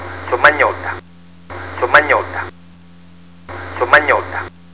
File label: victory